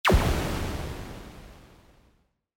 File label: Photon Fire; castelloe